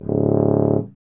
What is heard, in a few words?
BRS TUBA, Solo Instruments